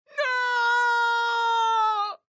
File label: Wrong Answer Feedback; spongebob; nickmaster